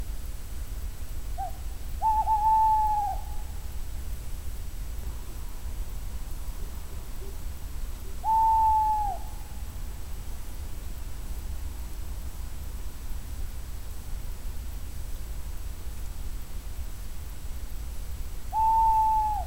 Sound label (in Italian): canto verso maschio allocco strix aluco tawny owl waldkauz carabo comun chouette hulotte